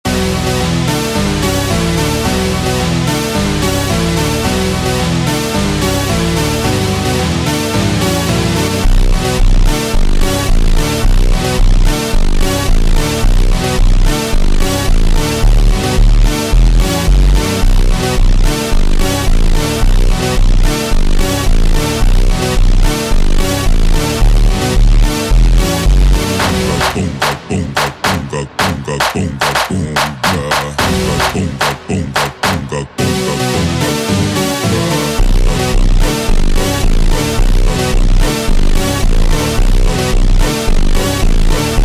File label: Automotivo super Slowed, funk